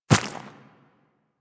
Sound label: Infested Small Walk Dirt, Footsteps; Warframe